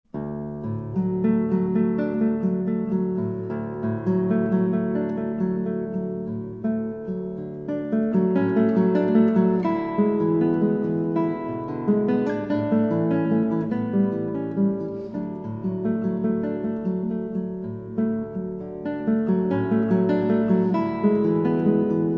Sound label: guitar; usa